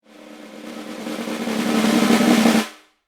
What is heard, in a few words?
marching snare drum; Music; DailySounds